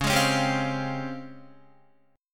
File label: Db Major Sharp 11th, Guitar; strummed; JGuitar